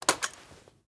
topcover open; Rust

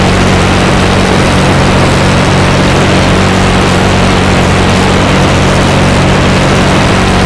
Aircrafts Engines Jumo213A; DCS

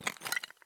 walk tile; Escape from Tarkov

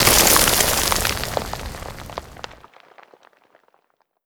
ceiling debris; Call of Duty Modern Warfare

